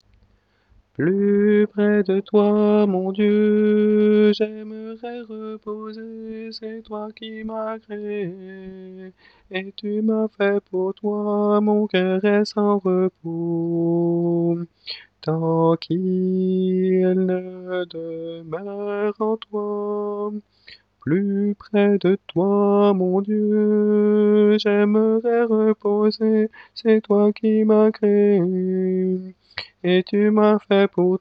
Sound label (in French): Plus près de toi soprane